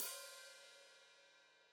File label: Hat Foot Open, Hi-Hat